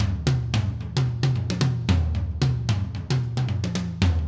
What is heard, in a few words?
Acoustic Live Drum; Platinum Audiolab